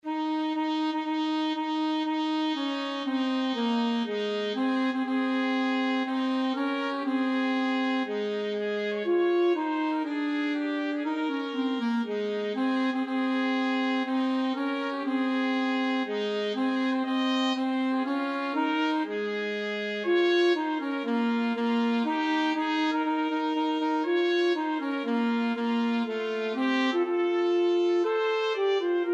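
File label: wedding march, trumpet alto sax; trumpet saxophone duet; wagner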